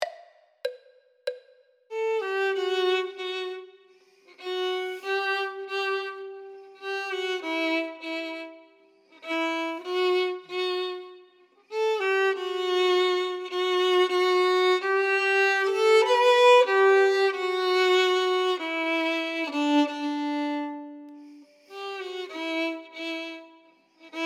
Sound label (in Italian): violino I